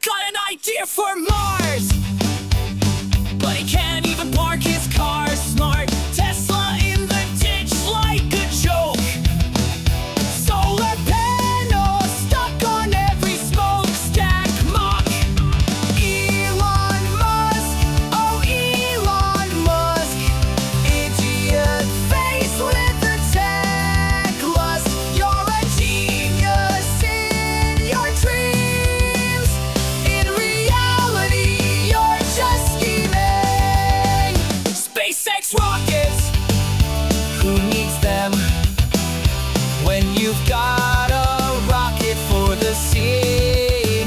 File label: Punk Rock